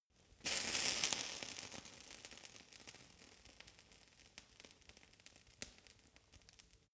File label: fire torch sizzle